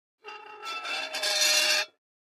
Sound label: Metal Scrape On Metal